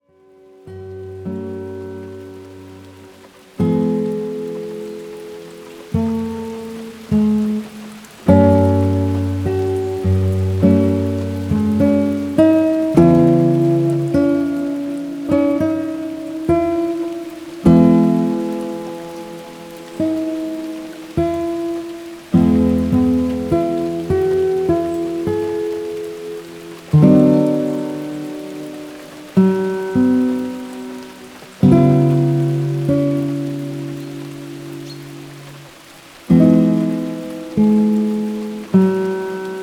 Spanish Guitar Lounge Music

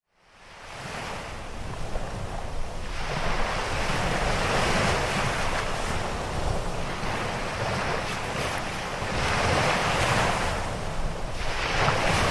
Ocean Sounds

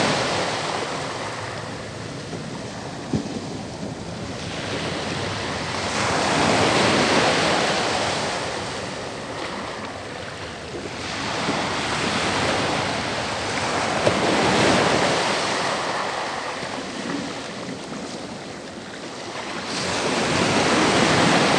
Calming Ocean; Natural White Noise

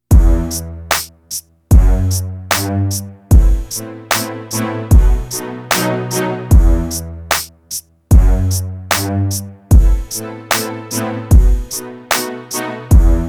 Classical Hip-Hop

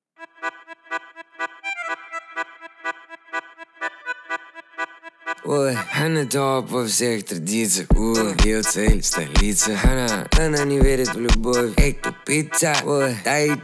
35-39hz Low Bass

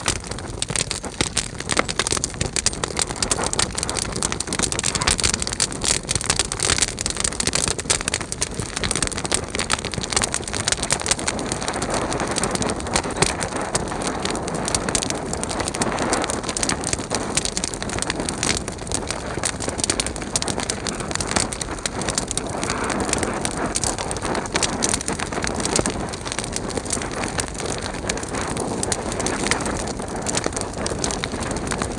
Medium Fire Crackling

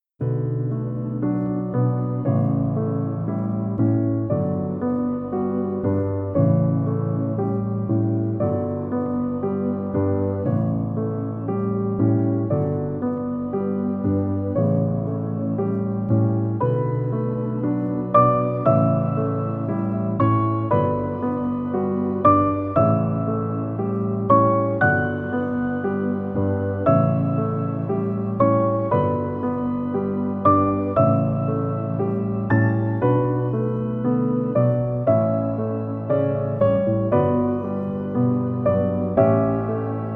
Piano

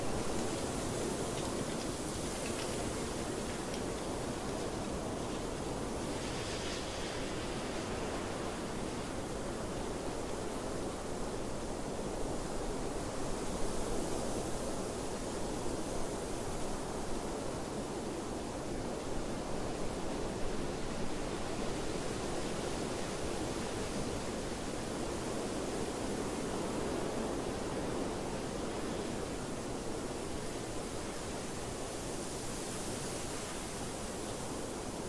Wild Wind

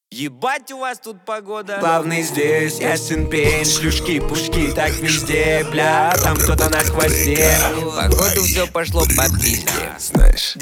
Low Bass, 31-35Hz

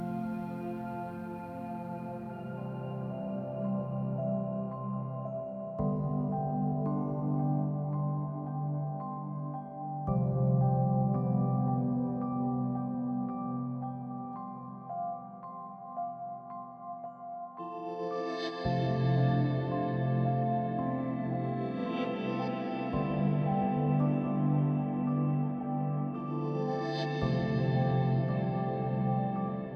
Classical New Age Piano Music

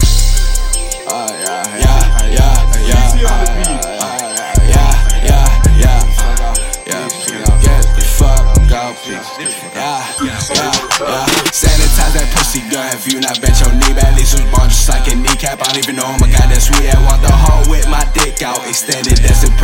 BEAT CLAP

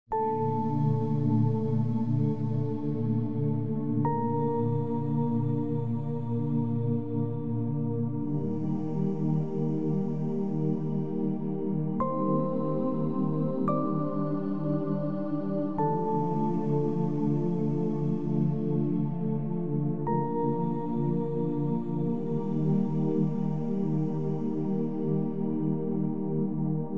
Aural Waves

